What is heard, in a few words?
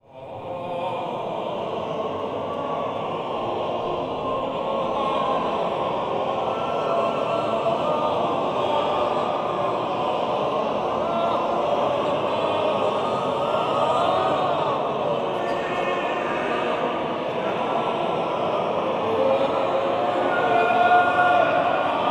warm up, voices